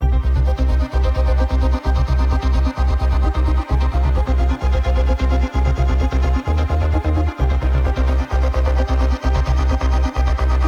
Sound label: Fastline Main Full 130BPM; Production Music Examples